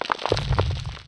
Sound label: Rock Fall Crumble; Playable Tutorial Level